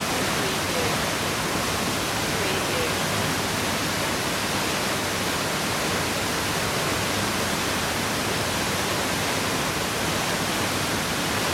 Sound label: big waterfall